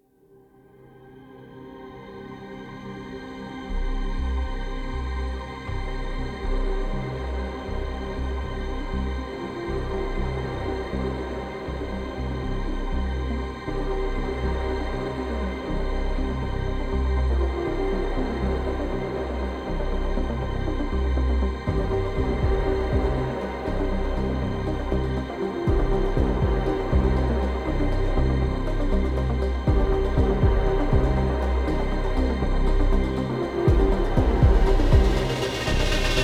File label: Energetic Wave, 120BPM; Production Music Examples